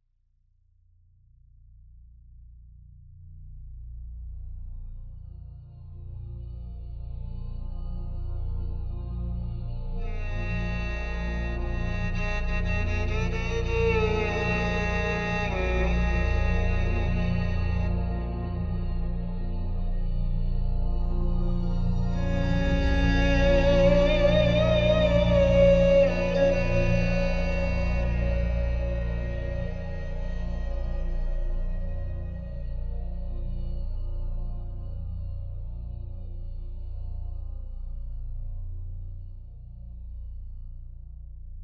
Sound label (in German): Province Akzent